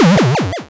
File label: Cry